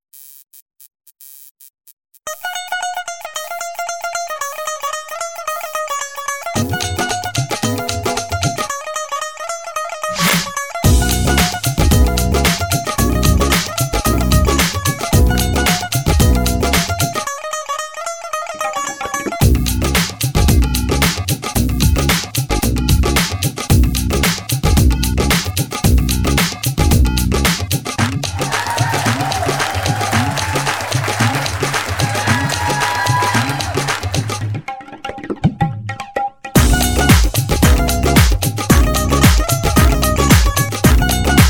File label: Instrumental